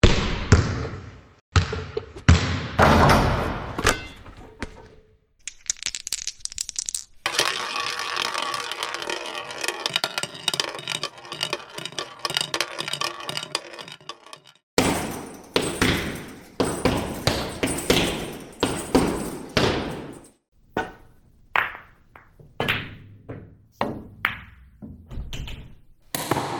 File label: Indoor Gaming; Field and Foley